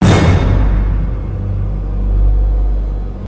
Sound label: piggy sound